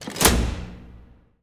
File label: lever; tardis2010; garrysmod